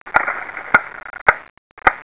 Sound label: Tic toc of a metronome; Tic toc of a metronome (tempest fuget!); Progress bar